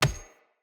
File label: Death Default; Enemy; Sinecure; Melee Grunt; Death Effects